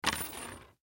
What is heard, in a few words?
Car Keys Pick Up, Car Keys Pick Up Slide Table; Foley; Blastwave